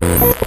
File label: hardsync tone, pocket calculator; pocket calculator 24